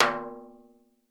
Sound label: REPIQUE; Definitive Percussion